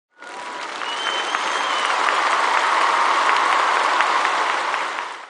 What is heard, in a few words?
Applause; Nokia; 5140i